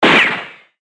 battle gun; maoudamashii

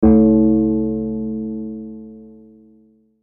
chime; maoudamashii

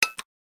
switch; maoudamashii

